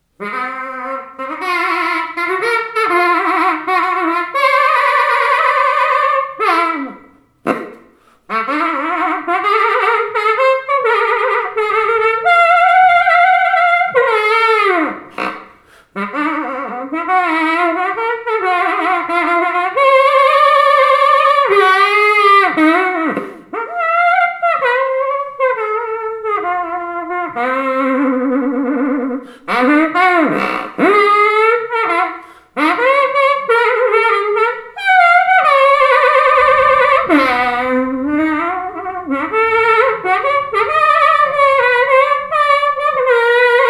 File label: SAX TOOTER F